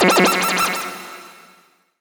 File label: SHORT, SHORTNOIS